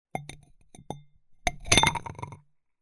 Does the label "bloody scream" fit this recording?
No